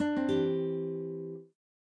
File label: Guitar; New The Denpa Men